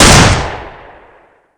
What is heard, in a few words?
scar fire; weapons